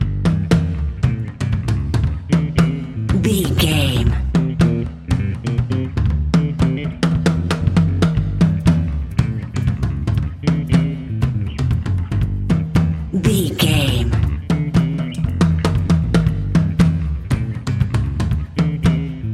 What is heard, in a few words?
cosmic disco